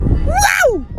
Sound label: Waoooow